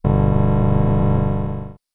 Windows NT Startup; Windows Never Released Soundpack 2

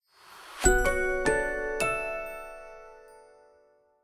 Ubuntu Startup, Operating Systems Soundpack; Linux

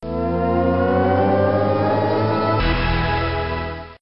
OS2 Warp 3.0 Startup; Windows Never Released Soundpack 2

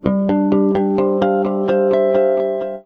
Verizon Startup Sound

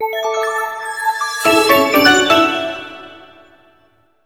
Samsung Galaxy A Startup; Samsung Galaxy A [SHW-M100S] (SKT Carrier) Startup

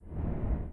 Windows NT Shutdown; Windows Never Released Soundpack 2

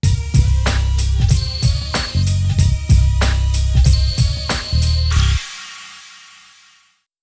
Shutdown; Windows 97 Shutdown; Windows Never Released Soundpack 1

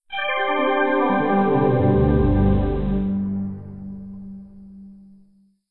OS2 Warp 5.0 Shutdown; Windows Never Released Soundpack 2

